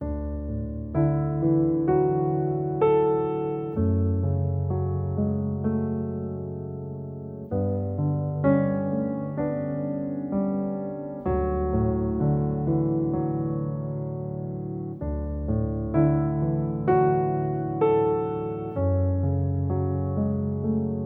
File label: Piano Solo; China